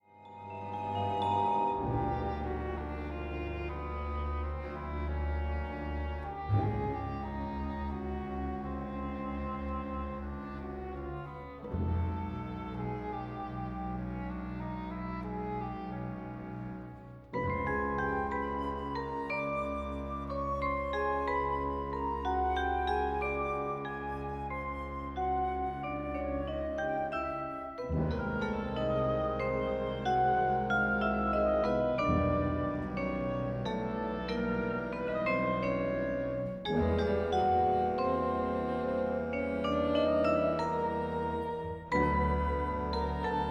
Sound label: Orchestra